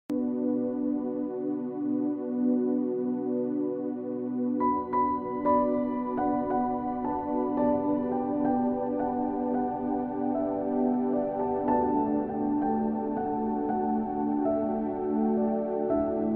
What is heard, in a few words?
Piano Instrumental Worship